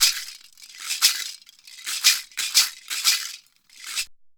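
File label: maraka groove; Best Service; World Colours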